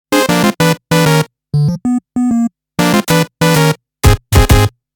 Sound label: win